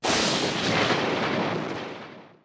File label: lightning